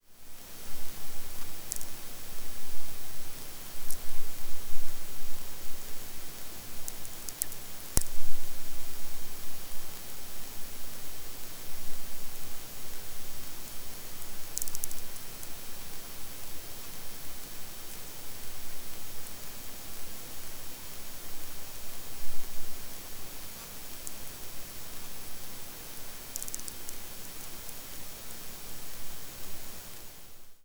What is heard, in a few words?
Elk Island VLF